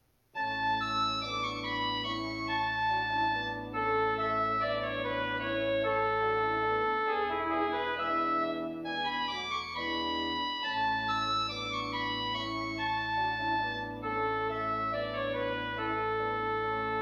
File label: Trumpet Time; Offertories